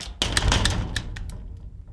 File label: brass; Mafie 1